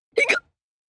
Hiccup Normal, Hiccup, 1 child, comical; Hiccupping

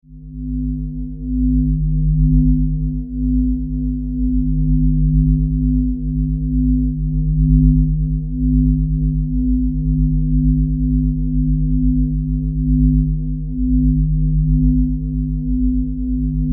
Science Fiction Magnetic Field Drone; Sci-Fi

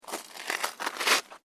SNOW CREAK

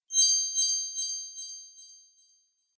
Magic Fairy Wand Wave

